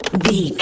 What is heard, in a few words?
lever turn push crank handle small